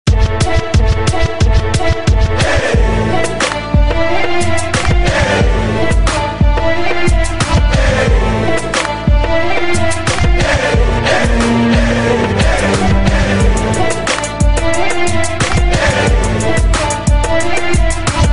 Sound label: Arabic Hip Hop